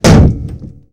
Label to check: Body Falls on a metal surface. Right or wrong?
Right